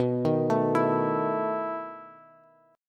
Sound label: B 11th B down Bass; arpeggiated; JGuitar